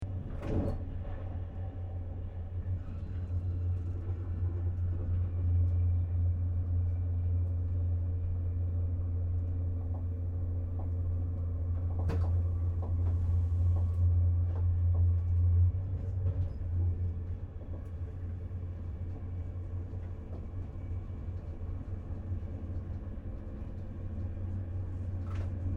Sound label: Eurostar High Speed Train Internal Between Carriages Train Movements Rattling; Transport; Train Sound Effects